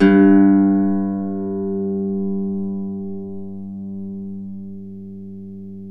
Celtic Harp

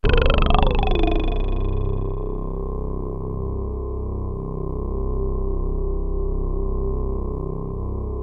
VOCALWOW; MORPHEUS

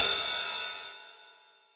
909 ride; Goldbaby Tape808 & Tape909; The Tape 909

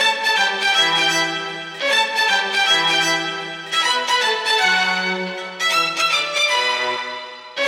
Disco Fever; LICK; Best Service ProSamples vol.48 - Disco Fever; AKAI

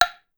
Woodblock; Clavia DDrum-AT

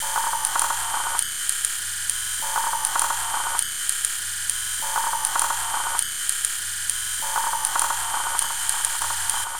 Gas Leak Beat, Electronic Beats; Sonic Foundry (Sony Creative Software); Myths of Technology

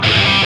GTR; Roland - LA Composer vol. 2

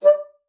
bassoon, D5; Xsample VOL-6

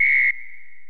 Korg x5, Drums

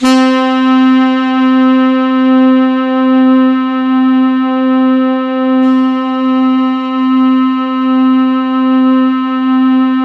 C4, Synth Line; 90 s Sample CDs; NiceBeats; SynthLine VOL-27

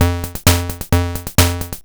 Disco, Hing-Hon EK001

